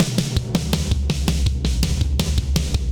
Fill Ambient Loud Punch 82bpm, Session Drums Studio; Tomzlla; Ableton; Factory Packs